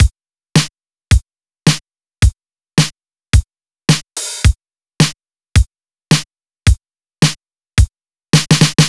drumloop swing; M8; percussion and foley

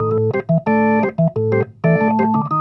B3 LOOP A, PLAYED

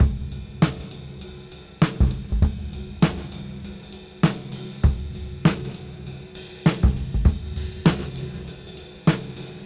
Cym Beat 99 BPM Rev; AMG - Keith LeBlanc Phat Butt Jams

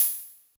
Yamaha DJX 2B, Rh Box HH Open

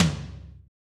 high tom; Giga Samples Collection; RY30

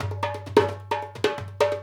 Dumbek; Essential Percussion

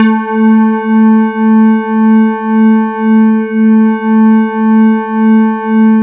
E-HARP, DX HARP; Wizoo - Powered DX

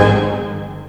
HIT, synths; Rubber Chicken Software Sample Bank

